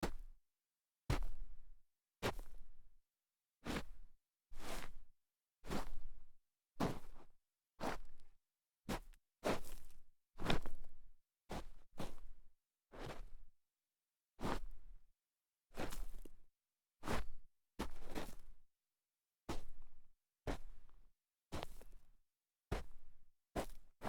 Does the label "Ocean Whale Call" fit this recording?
No